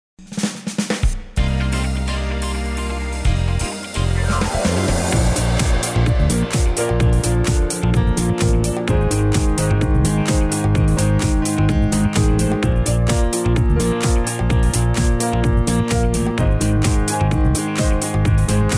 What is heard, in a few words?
disco chips rhythm track, square dance music; Silver Sounds Records